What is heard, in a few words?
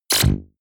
sci-fi shock squeeze